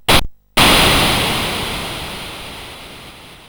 Shuffle BASIC sid CSG8580R5

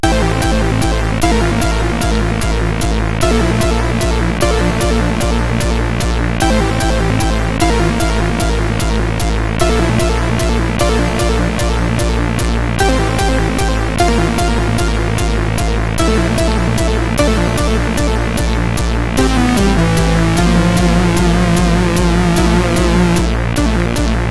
Atmos, CSG8580R5

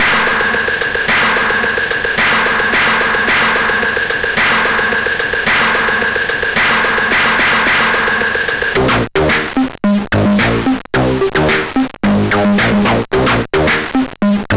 Boogie, MOS6581R4

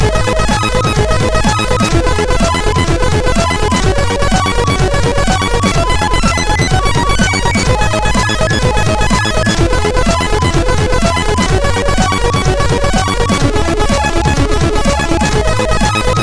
Dance 2, sid CSG8580R5

